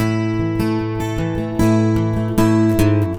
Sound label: Picking A 80